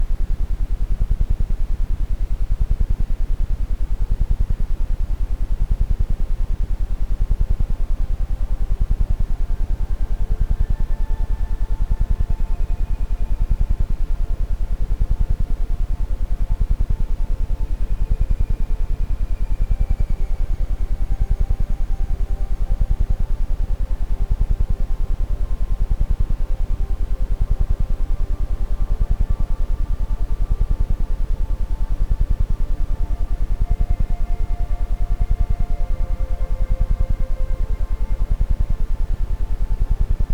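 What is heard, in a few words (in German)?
Iso Gefuehle Vertrauen Quickie mit Ambient Sound, Isochrone Töne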